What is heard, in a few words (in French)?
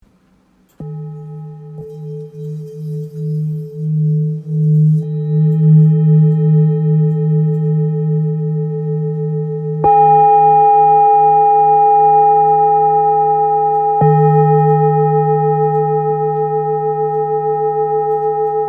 Bol ancien re